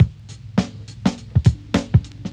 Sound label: beat